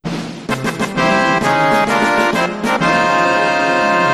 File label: Victory Fanfare, Lock Chime; Final Fantasy